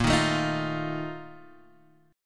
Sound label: Bb Major 7th Suspended 4th, Guitar, Half Step Down